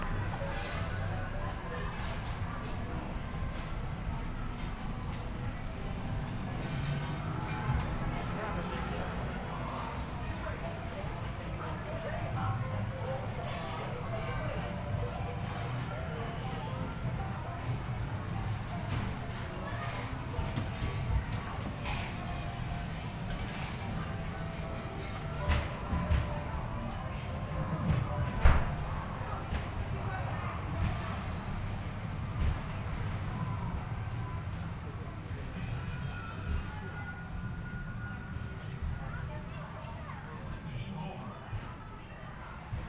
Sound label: amusements; Weymouth